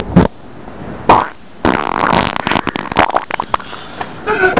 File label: bubble